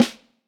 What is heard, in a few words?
Vintage Snare Drum